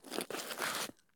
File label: turn asphalt; Escape from Tarkov